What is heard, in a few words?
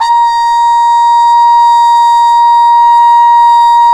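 brass cup mute trumpet, dry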